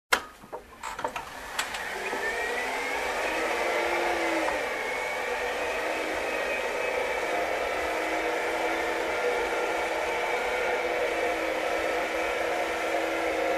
Electric Shaker Turned On and Off

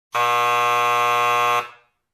Buzzer Horn

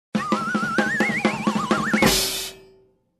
Comedy dizzy with drum roll

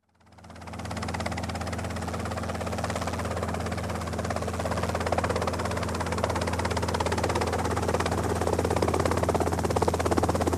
Helicopter engine shutdown

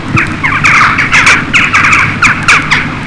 Choucas chirping

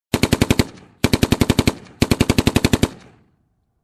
Machine gun shooting sound

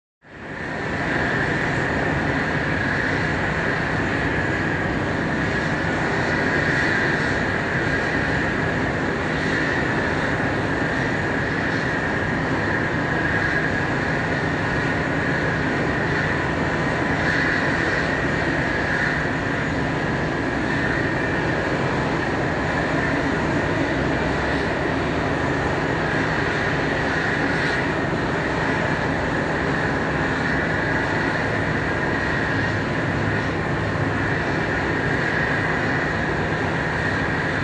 Airliner on standby airscrew noise

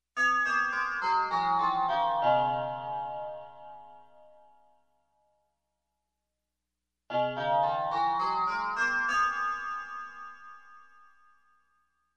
Bells scale down and up

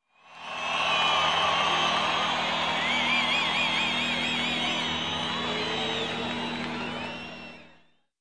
Herd in an activity center cheering

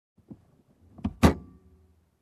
Closing compartment in car

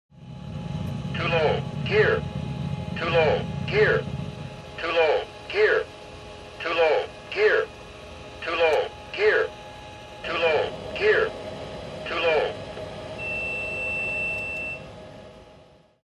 Airliner Cockpit Ambience

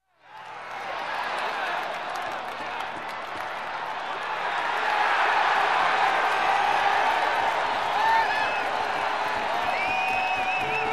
Crowd in a game cheering and whistling

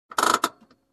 Car Hand Brake pull

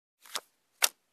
Play Cards Dealing

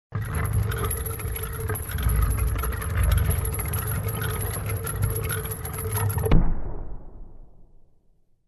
Industrial Sliding Door opening

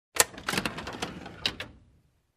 Opening compartment

